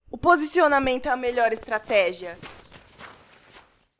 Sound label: Walk; Elane